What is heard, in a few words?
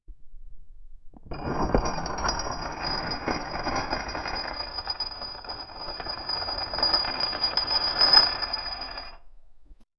the sound of a metal, hardware